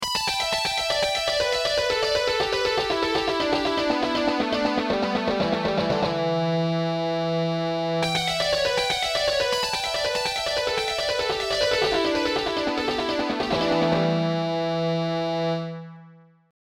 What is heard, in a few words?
guitar; Terrifying guitar 101; guitar player licks and lessons